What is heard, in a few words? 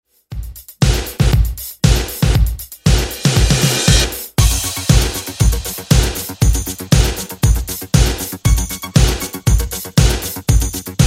Re Drum 80s Dance